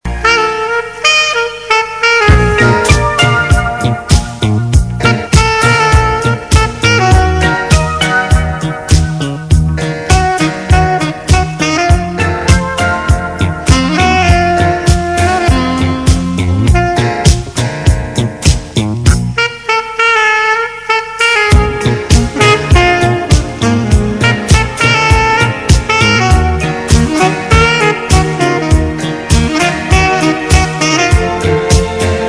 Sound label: SAX; KLASIKA